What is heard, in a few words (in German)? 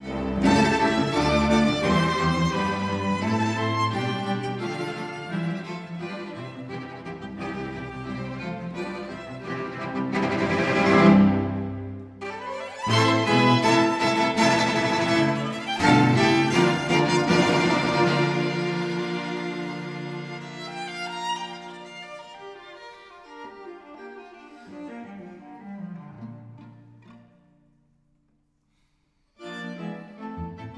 streichsextett wet